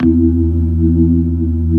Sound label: E2, Keyboards Old School; USB Soundscan vol.09; AKAI